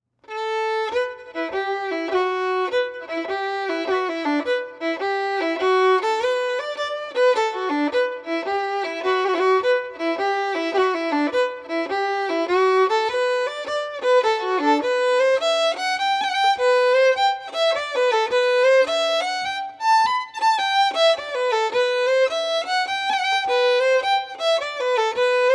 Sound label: slip jigs; The Butterfly – A Fig for a Kiss